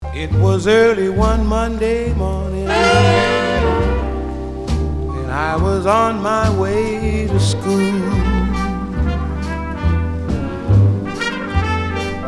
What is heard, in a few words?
Wee Baby Vocal